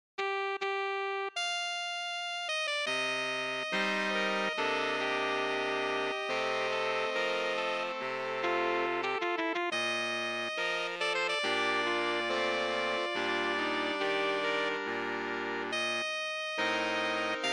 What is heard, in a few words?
Sax